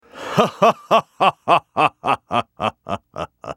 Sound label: laugh; LubuJarvanIV